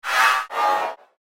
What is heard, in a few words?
Bad Breath Bot, robot sound effect; Robot Sound Effects; Andrew Fleming